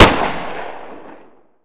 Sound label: sg552; cstrike; ZP CRISTIAN; ZPB WEAPONS